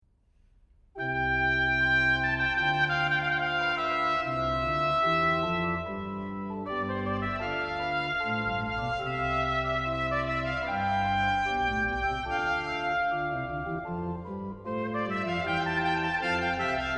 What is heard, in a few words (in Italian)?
Sonata per tromba sola; Sonate per organo e tromba; Sonata Prima; 'Capricci armonici da chiesa e da camera' Op 4; Andante